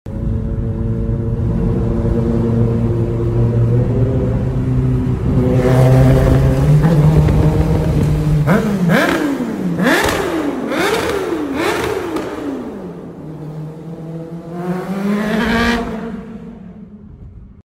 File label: kawasaki ninja zx